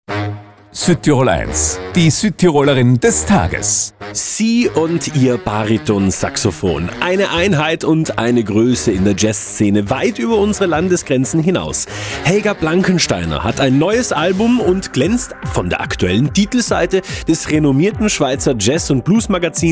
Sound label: JAZZ